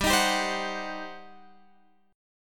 G Major 7th Suspended 4th Sharp 5th, G, down

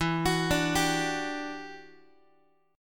E Minor 9th, down, Guitar; Em9 arpeggiated; x,12,9,12,11,x

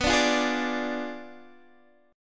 B Diminished 7th B down Guitar Open D

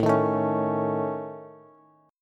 A Major Flat 5th, Bass; strummed

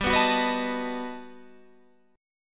G Augmented Major 9th, Guitar, Half Step Down

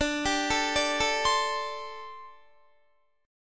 D 7th Suspended 4th D Guitar; D7sus4; arpeggiated; 22,22,19,19,21,19

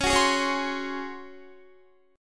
Db Major 7th Suspended 4th Db down Guitar; strummed

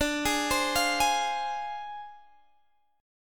D Minor 7th Flat 5th Guitar Standard; Dm7b5; arpeggiated

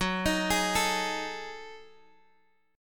F sharp Minor 6th Add 9th, Guitar, Open D; chordshape; down

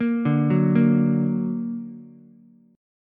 D Augmented, D, down, Ukulele; arpeggiated; JGuitar

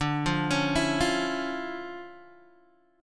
D Minor Major 9th D down Guitar Open D

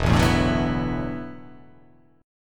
E 7th Suspended 2nd Suspended 4th, down

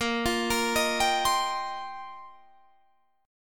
F 7th Suspended 2nd Suspended 4th

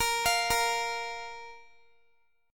Bb 5th Bb Guitar; arpeggiated; x,x,20,22,22,x

